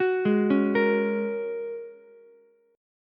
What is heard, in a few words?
G sharp 9th Suspended 4th G sharp Ukulele C6; G#9sus4 arpeggiated; 11,8,9,13; JGuitar